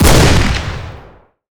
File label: fire; Urban-Coalition; Decay; arccw ud